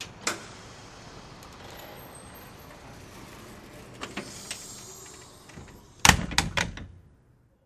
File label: Hospital Door Close, pneumatic